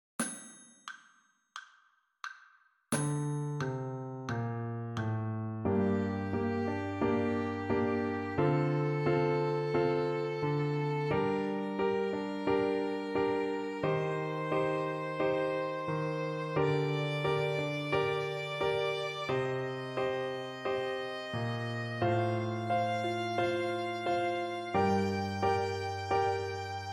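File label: G natural minor scale, fiddle; Fiddle Time Scales 1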